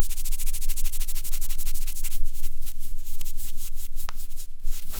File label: delicate brush on surface